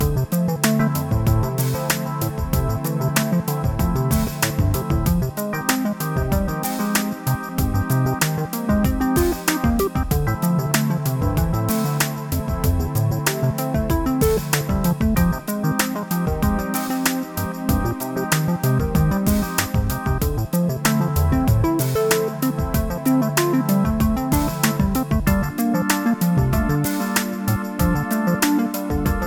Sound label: Pixel Balloons, puzzle music